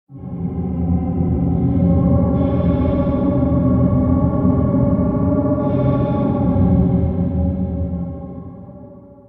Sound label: dramatic swell; linux macos windows gamerip 2011; Spiral Knights